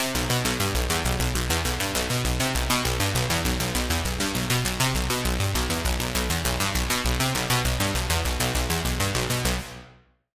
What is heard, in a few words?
Pluck, Sylenth1; Pluck - Blasphemous Sect; Routed Sound; Sylenth1 Expansion - Doom Carpenter Vol.1